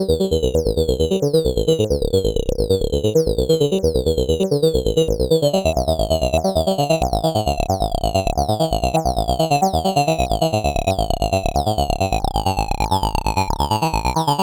Random Loop Both Filter Maths Modulating 2nd Oscillator Freq